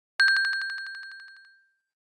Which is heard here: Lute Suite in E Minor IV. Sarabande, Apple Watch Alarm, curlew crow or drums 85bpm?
Apple Watch Alarm